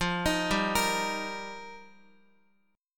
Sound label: F 7th Suspended 2nd Suspended 4th, Guitar; F7sus2sus4; arpeggiated